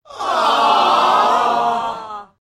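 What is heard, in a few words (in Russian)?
tolpa rasstroennyh ludey aaa, crowd of upset people "aaa"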